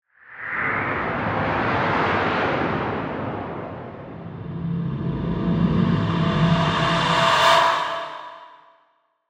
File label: Ghostly Swirl, Horror, Ghostly, Swirl, Haunting; Ghosts